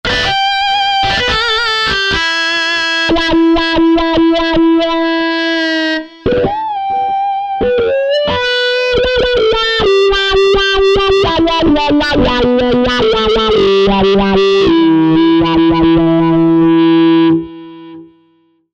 Wah Gtr

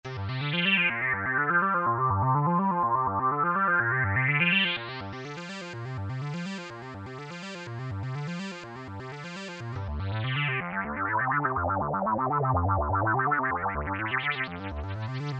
Atm Lunar Arp; Factory Presets

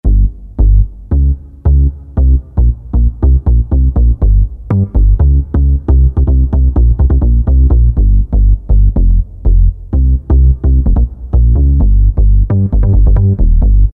Dance Bass, Korg N364; Factory Prog demos

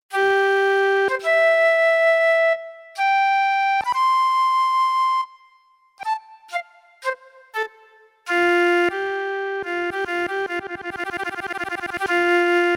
Flute

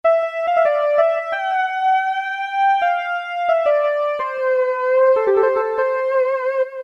Monotone Lead; Roland PN-D50-03

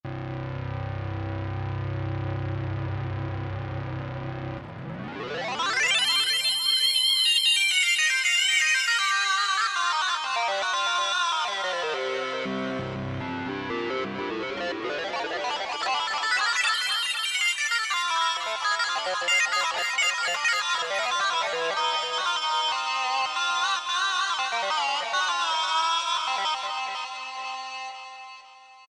Mono Synth, Korg M1; Factory preset examples

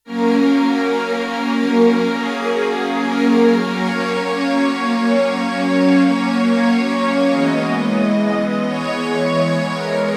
Roland JX-1 Syn Strings A & Syn Vox A; Factory presets; Dual patches

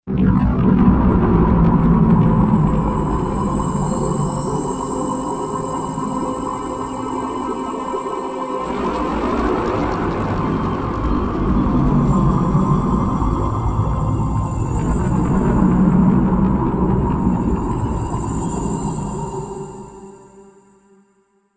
Explosion

